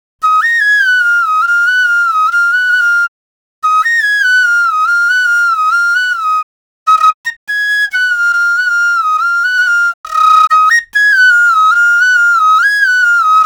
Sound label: Drill Flute Loop BPM 140 D Min